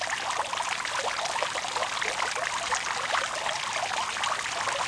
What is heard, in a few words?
robin